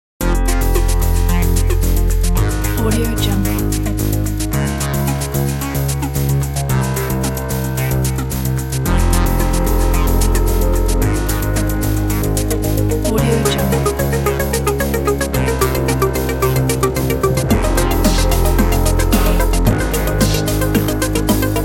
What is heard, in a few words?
news jingle